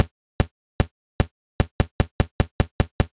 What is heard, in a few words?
snare drum